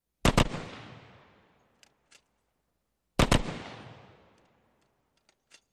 .50 Caliber: Double Taps, Reload.

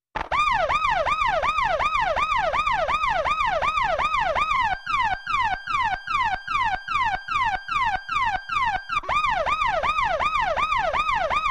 Yelper Siren Close, wailers

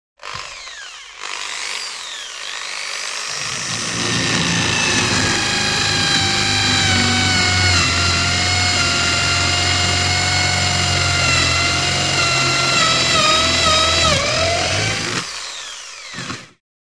Drill Electric; drills

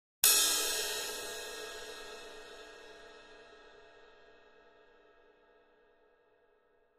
Cymbal Sml Sngl Hit, drums cymbal

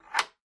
stall door latch; latches & bolts

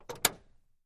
Camaro Door Close Lig, chevy camaro

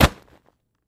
Bag 50 Pounds Throw G, bag drop

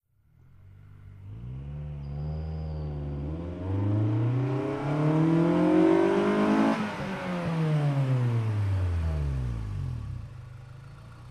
Integra Soft Shift Rev, acura integra

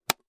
cup lid close

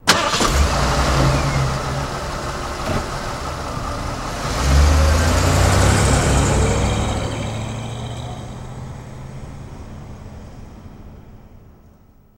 Car Compact Strt Idle

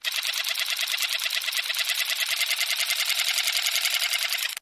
Motor, Small; Electric Toothbrush, Reversed

